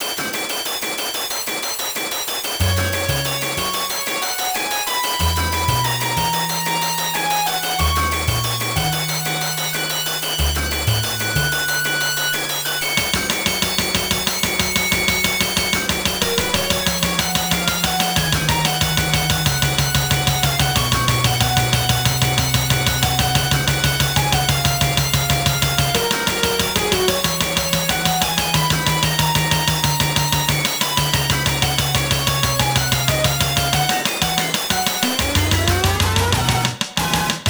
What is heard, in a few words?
Moon Theme Metal Pipe